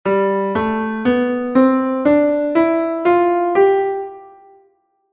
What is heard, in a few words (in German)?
Mixolydisch